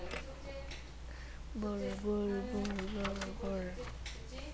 bowl

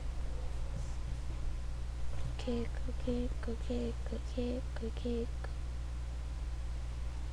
kick

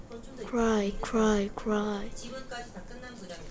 cry

